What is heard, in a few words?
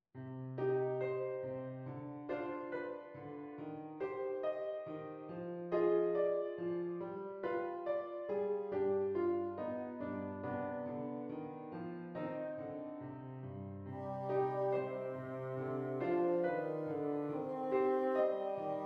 Beautiful Evening bassoon